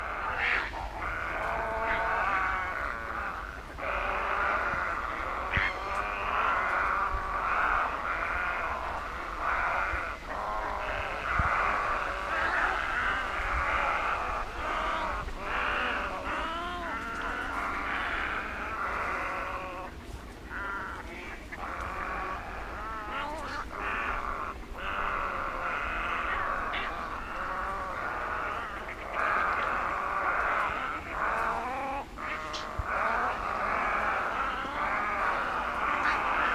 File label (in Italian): Garzetta Egretta garzetta